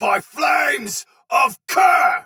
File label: attack heavy soldier sword agent male; Dialog; Barks; Battle; Melee; Sinecure